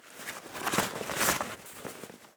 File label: getting dressed; torn